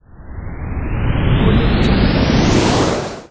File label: hover plane launch